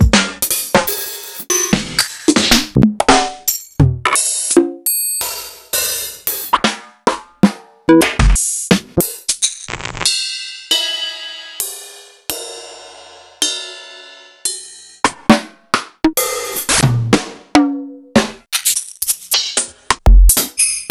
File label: EMU; Planet-Phatt1